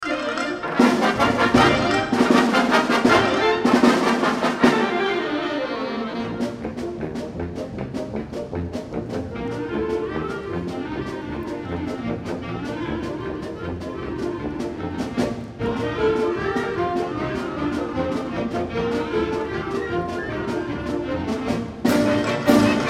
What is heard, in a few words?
Galop; Galop Bulesk